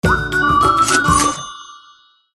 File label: Win Points